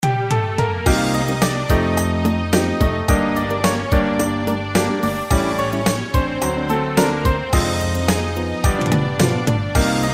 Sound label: midi